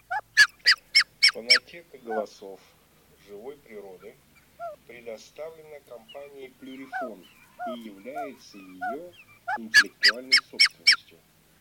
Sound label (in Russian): Фазан курица Fagiano; plurifon